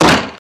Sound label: Kick Wooden Door